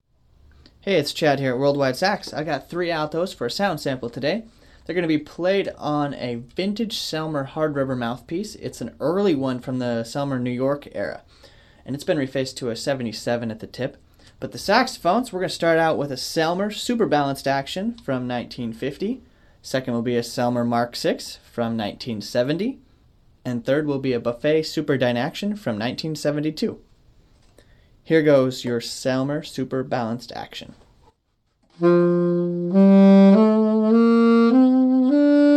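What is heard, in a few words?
Altos, alto saxophone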